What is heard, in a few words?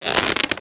catapult shoot idle